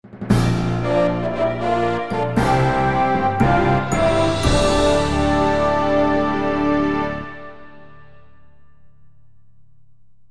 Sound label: Fanfare; Super Trench Attack